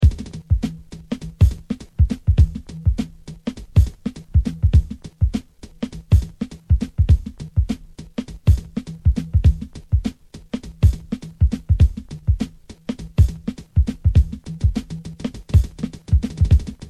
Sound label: funky drums